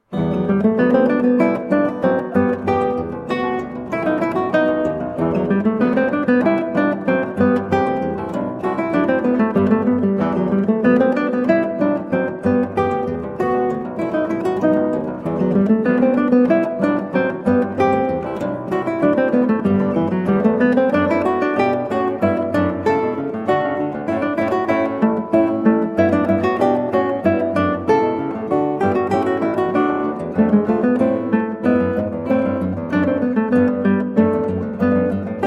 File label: Vivace, guitar, spoken; Partita 5 in E Minor TWV41 E1; Georg Philip Telemann